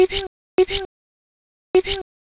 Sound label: Processed Vocals, Loops